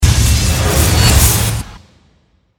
Force Power Burst, Force, Power, Burst, Break, Suction